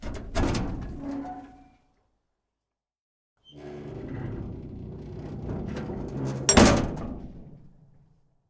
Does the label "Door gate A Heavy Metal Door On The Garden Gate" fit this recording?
Yes